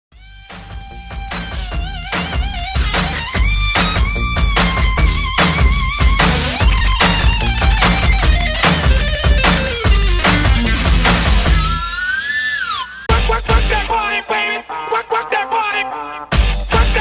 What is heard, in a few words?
90s Dance Re Drum 148 BPM